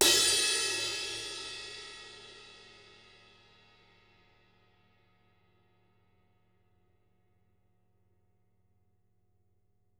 crash mid, Drum Kits; Sonic Reality

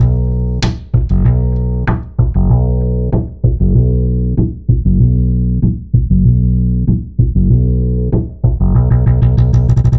Remixed Acoustic Bass

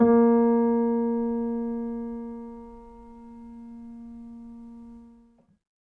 MONO, Acoustic Piano

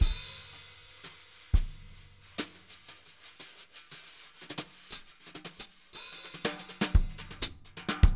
jazz brush loops

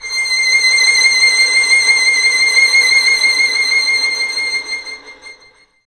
Violin; Miroslav Vitous; Solo Instruments

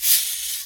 CABASA; Sonic Reality; Interactive Drum Kits

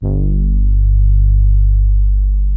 soft brass, poly mid G1; Music Store - Claudius Diemeter Schwerlos

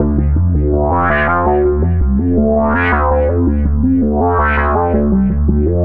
Synth Bass

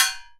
Satellite Drum; PX7; Digital Sound Factory Protean Drums

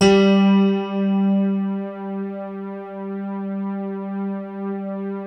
BALLAD G2, E-PIANO; Music Store - Claudius Diemeter Schwerlos

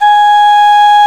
Suling G#4; Multisamples; Digital Sound Factory Planet Earth

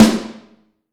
Snare Drum

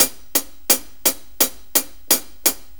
4-4 HiHat Loop; 4-4 Drum Loops; HiHat Ride Loops; Sonic Foundry; Drum Tools

